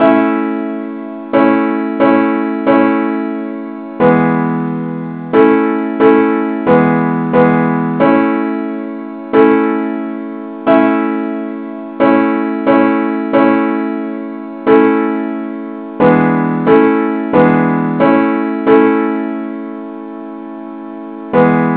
simple acc key a

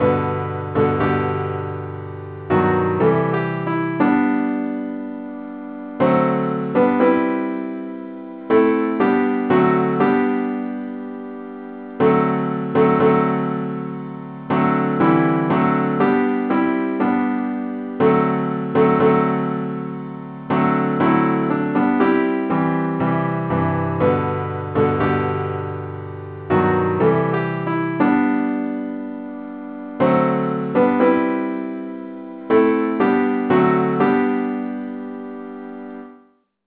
simple acc key g

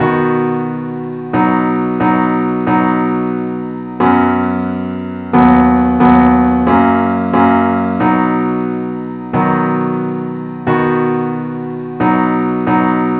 parts only key b

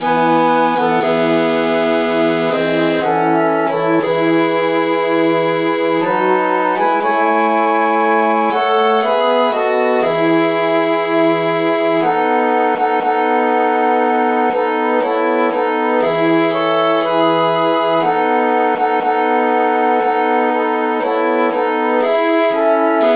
traditional key A

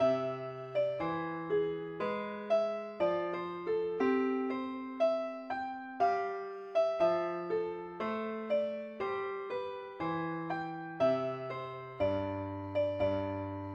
mandolin, key c; pilot

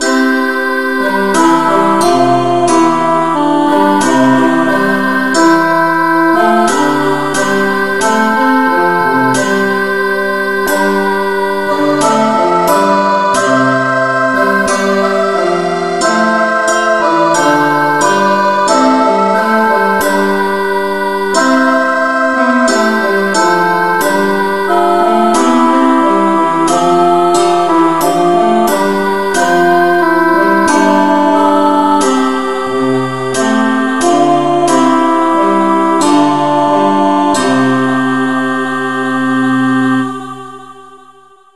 no vocal, key c; christe Sanctorum

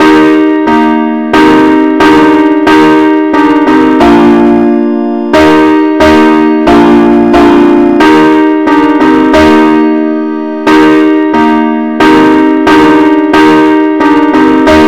compare Chords

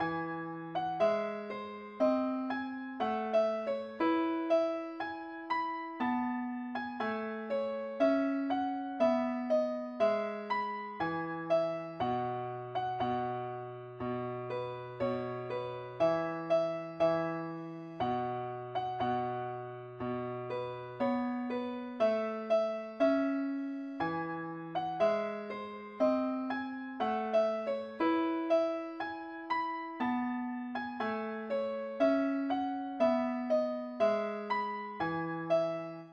mandolin key e; pilot

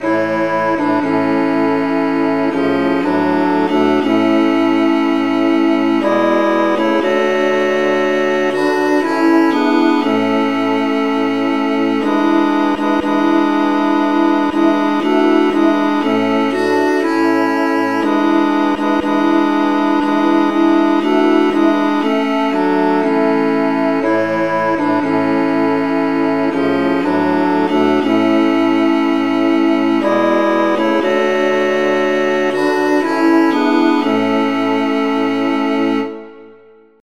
traditional key D

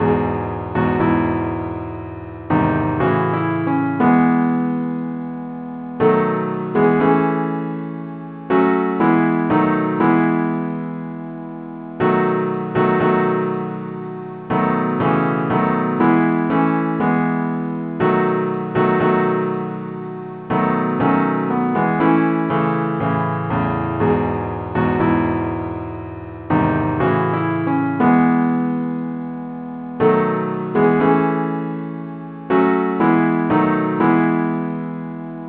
simple acc key e

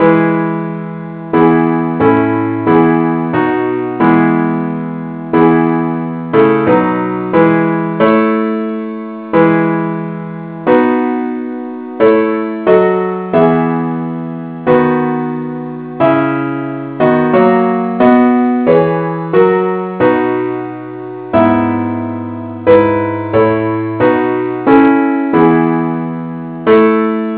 parts only key e; christe Sanctorum

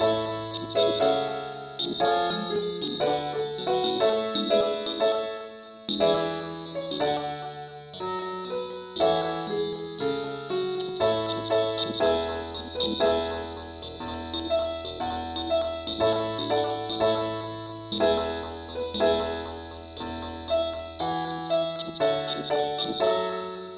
ukelele key a

